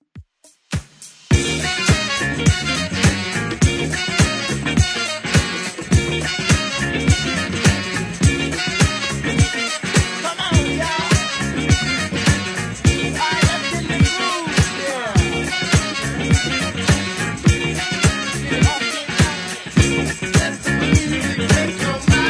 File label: 70s 104 bpm; Redrum